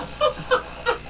laugh

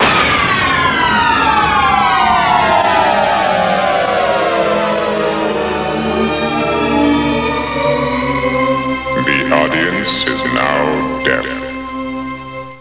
thud

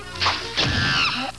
YELL

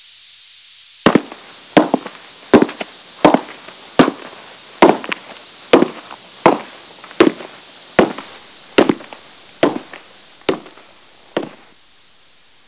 footsteps, Ominous sounding footsteps

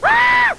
aaah; simpsons

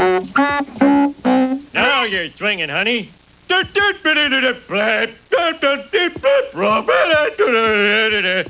sax

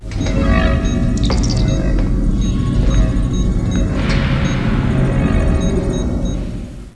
borg ship sound; Various Borg Sounds

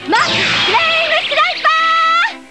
flame sniper; Sailor Mars

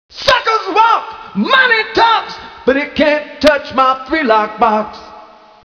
lockbox

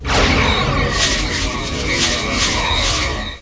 borg tractor beam

